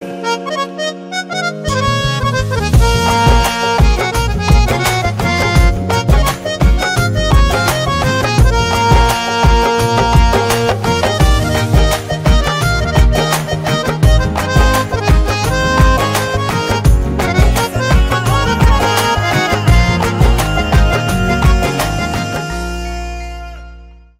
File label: Instrumental